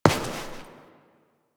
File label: Step; Warframe; Koumei